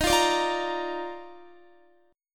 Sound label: Eb Major 7th Suspended 2nd, Guitar; strummed; chord; JGuitar